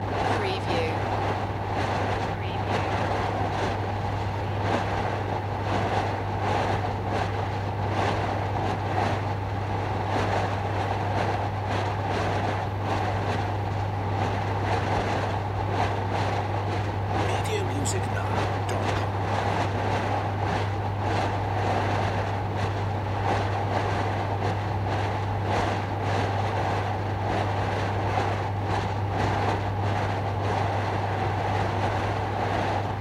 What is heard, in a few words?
room air condition loop